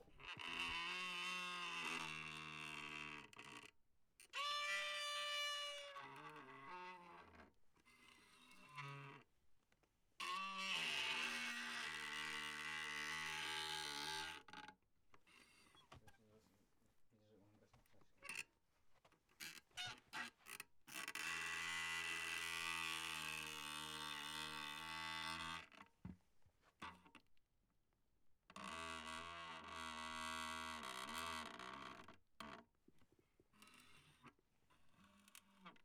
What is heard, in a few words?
Rusty Metal Creaking